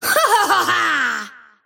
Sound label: kid laugh; Dota 2; invoker